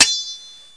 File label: SWORD; PC Gamer IT CD 31; DINK